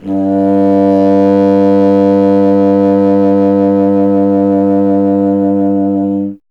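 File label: wind contrabassoon; Miroslav Vitous; Solo Instruments VOL-1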